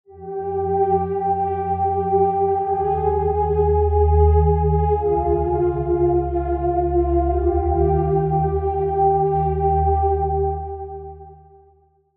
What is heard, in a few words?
Pad; Routed Sound; Spire Expansion - Reality Maker Vol.1